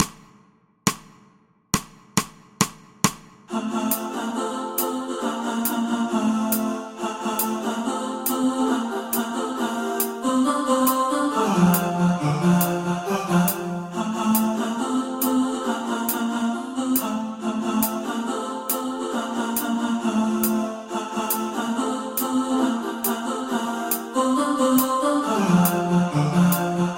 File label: hommes; Tenor